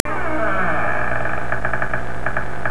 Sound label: creak; SpookHouse